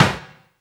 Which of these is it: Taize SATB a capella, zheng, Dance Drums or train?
Dance Drums